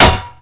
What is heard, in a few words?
hammer, impact